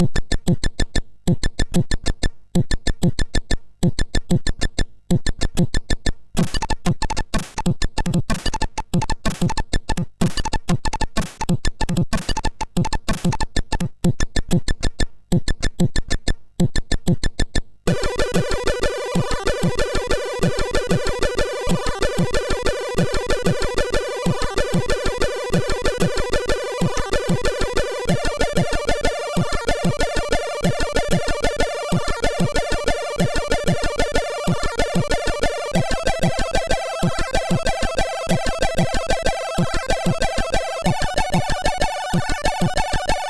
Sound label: Bongo Rythm, MOS6581R2; Anakonda